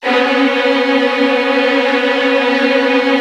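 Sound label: Virtuoso Strings, violin trills, B1; ILIO; Kirk Hunter Virtuoso Strings VOL-1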